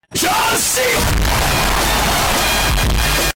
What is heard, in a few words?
farting